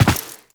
Land Default, Footsteps; Warframe; Tenno

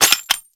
Ammo Pickup; Warframe; Pickups

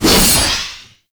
Glaive Throw; Warframe; Zealoid Prelate

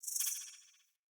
Acorn Timing Pulse Out; Quick Time Events

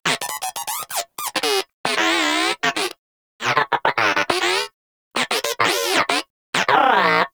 Moa Inquisitive Vocal Interact; Pets; Warframe

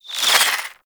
Bow Arrow Rain Whizz By B; Ultimate Abilities; Madurai; Warframe; Focus

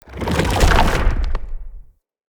Polymorph Tree End Cast; Enemy; Abilities; Characters; Mendicant; Mnd Wazard

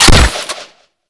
Hunting Bow Fire; Hunting Bow Prime; Weapons

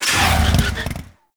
Motorcycle power slide stop, bullet jump; Warframe; Motorcycle Ninety Nine

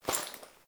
Body Move

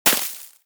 Bullet Impact Debris Snow; Warframe; Weapons; Bullet Impacts

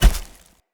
Step Vegetation, Footsteps; Characters; Enemy; Mendicant; MndKing

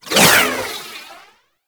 Parazon Whoosh; Warframe; Kuva Lich; Finishers

